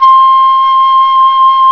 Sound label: Flute Hi; Instrmnt; Sound Library 2000; Wayzata Technology